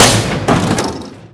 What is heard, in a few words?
fire smoke, tank